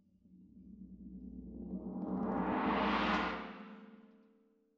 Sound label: Tam-Tam Close